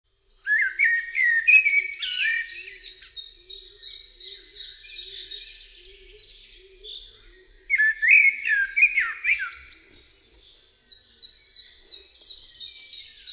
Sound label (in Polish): KOS, birdsounds